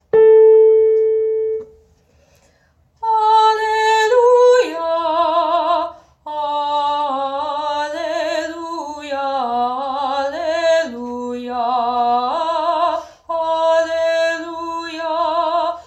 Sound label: alleluia alto